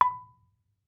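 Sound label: Marimba rubber fortissimo B5; MIS Pitches - 2014; Percussion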